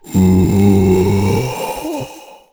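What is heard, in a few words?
zombie attack; sinfar haks; sf effects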